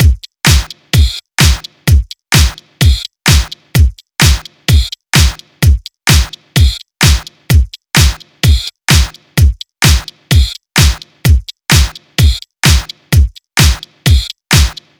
128 House Beat; Dance DJ; Pro Tools Essential Demos; Digidesign; Essential Loop Demos; Demo Session - Dance DJ

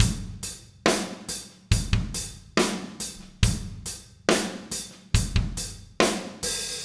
Powerballad Drums Verse, Slow Tempo; Pop Rock; Digidesign; Pro Tools; Pro Tools Essential Loops

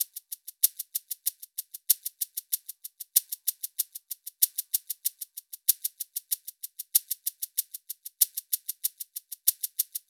HipHop Shaker; Digidesign; Pro Tools; Pro Tools Essential Demos; Essential Loop Demos; Demo Session - Hip Hop

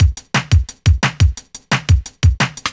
175 D&B Drums

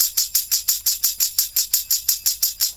Tamb; Pro Tools Essential Demos; Digidesign

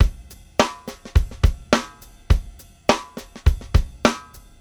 104 Pop Funky Dr-Groove Ride; Pop Rock; Moderate Tempo; Pro Tools Essential Loops; Digidesign; Drums

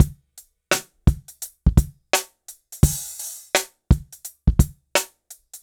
085 Pop Hip Drums; Pop Rock; Moderate Tempo; Pro Tools Essential Loops; Digidesign

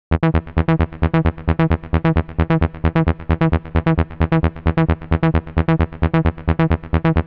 132 House Arp E; Dance DJ; Pro Tools Essential Demos; Digidesign; Essential Loop Demos; Demo Session - Dance DJ

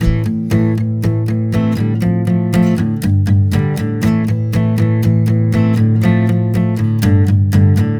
120 Pop Ac-Guitar 8th Amaj; Pop Rock; Acoustic Guitar; Pro Tools Essential Loops; Digidesign

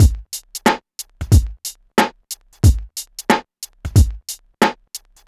Hip Hop Beat, Drums

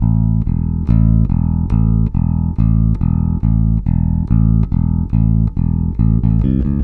Country Bass Verse; Digidesign; Pro Tools Essential Loops

